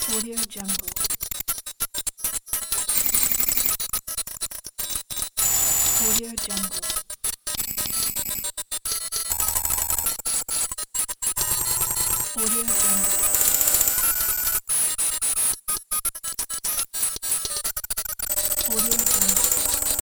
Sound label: data transfer